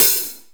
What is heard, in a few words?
present hi-hat sound e key